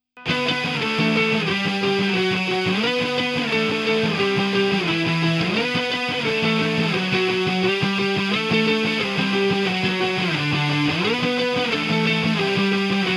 Lead Guitars

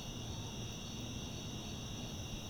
crickets night

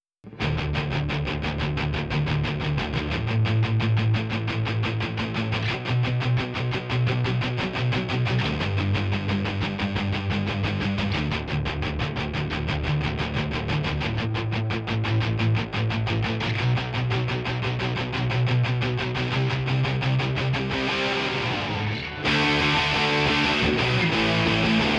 Rhythm Guitars

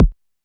808 BD short, Roland-808 Drum Hits Bass Drum

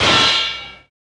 Metal Clang; tools Bangs

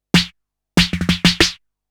808 Loop SD 95, Roland 808 Drum Loops, 95bpm

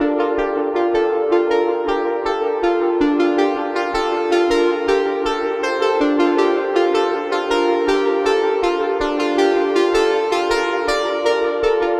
80 Dm Synth; Ambient Synth Loops; Aerosol

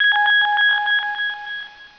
sonarish

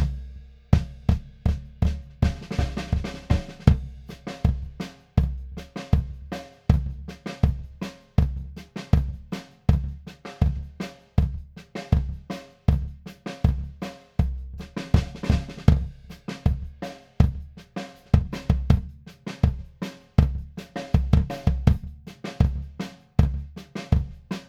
Drum Bass

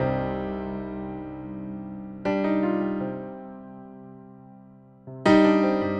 Emotional Piano

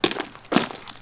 Footfalls Gravel; footsteps

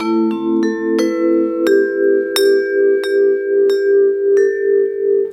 Lounge Vibes, loops

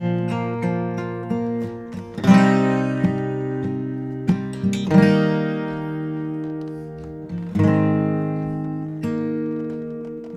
Acoustic Gtr

